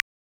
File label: Dry click, Univox SR-95